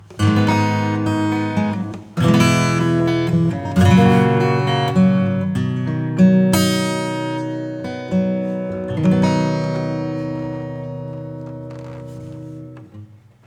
acoustic Dark Plate; XenoVerb

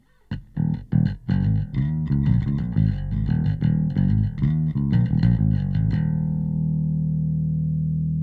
bass Bass Electric; smart:comp 2

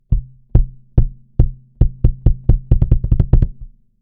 Kick; VLA-2A Mark II

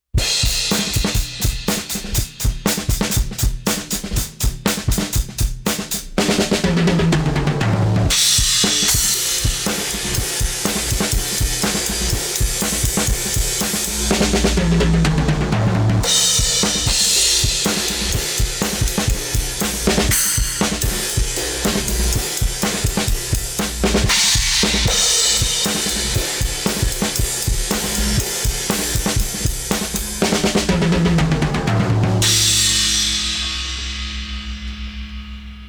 bass cut; tranQuilizr G2; Bax

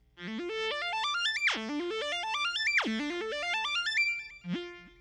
Nord Lead 4

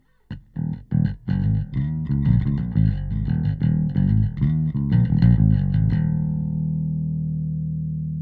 Limit bass Bass; SSL X

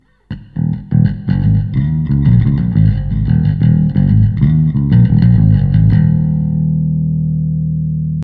bass REVERB; Joe Chiccarelli

